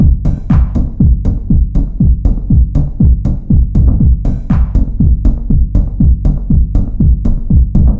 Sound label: Bgm Just techno; Friday the 13th: Killer Puzzle